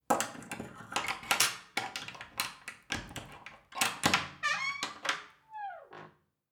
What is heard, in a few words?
Closet Door Open